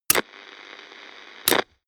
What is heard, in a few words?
turn on off